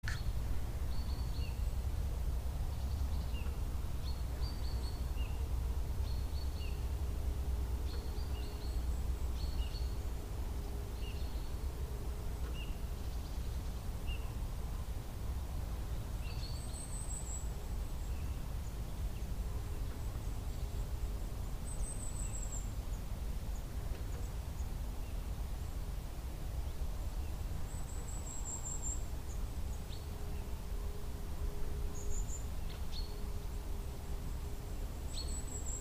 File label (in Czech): Ptaci sad